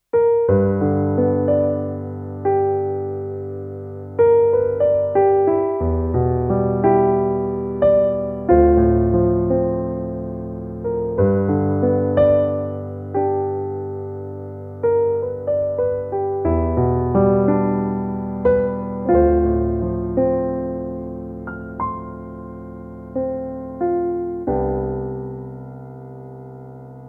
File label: Thomann SP 320 Grand Piano